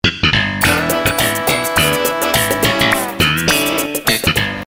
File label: sitcom transition bass riff; seinfeld